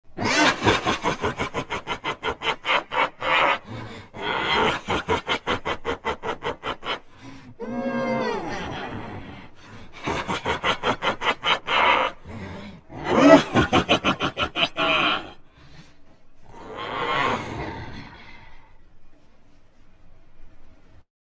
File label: laugh double bad guy